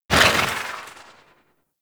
Swarm Rifle Tail; Warframe; Infested; Shock Swarm Rifle

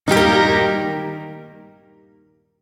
Shawzin Min Chord C; Warframe; Duviri Shawzin

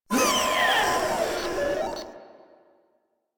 Death; Proto Infested; Warframe; Infested Ninety Nine

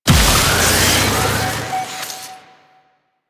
Excavation Gas Deliver; Warframe; Nineteeen Ninety Nine; PvPvE

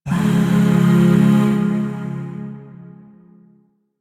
Shawzin Phrygian Chord B; Music; Chords; Warframe; Zariman Void Shawzin; DE

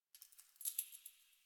Coin Drop; Warframe; Ambience; Nineteen Ninety Nine

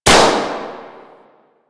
balloon pop

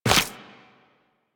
Run Goo, Footsteps; Warframe; Tenno

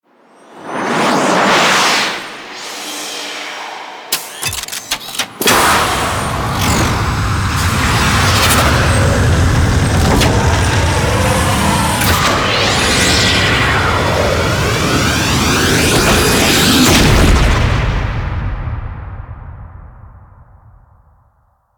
Archwing Spack Docking Extraction

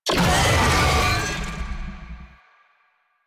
Infested Oni Vocal Pain; Warframe

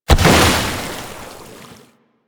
Bubblegun Pistol End Explosion; Warframe; Weapons; Tenno

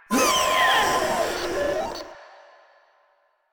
Proto Infested Runner Death; Warframe

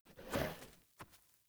Wolf Steps Jog Start; Animals; Foley Actions

